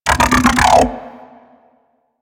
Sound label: Mech Ambient Vocal; Nechro Mech; Vehicles